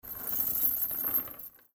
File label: chains dropping